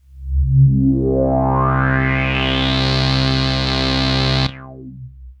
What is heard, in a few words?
Arp C C2, ARP Odyssey; synth; musicradar-synth-samples